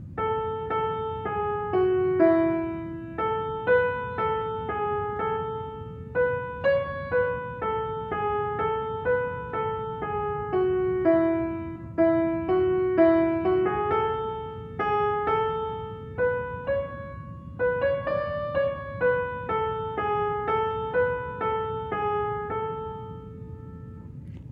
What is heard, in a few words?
Melody; Wareham